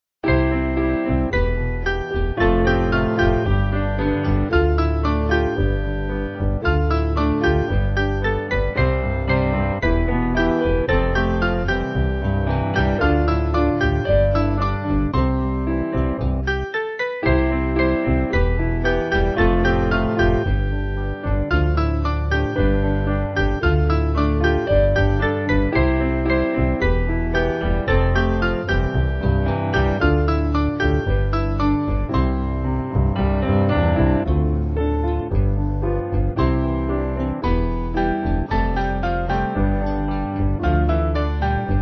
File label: Piano